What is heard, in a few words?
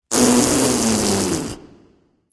tongue, voice; Smite; ymir skin9